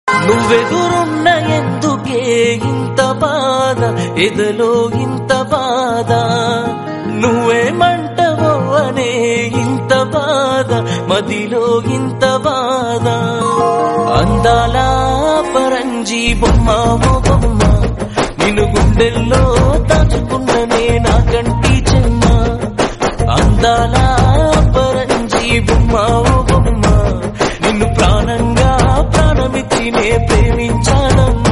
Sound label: telugu movie song; ghatotkachudu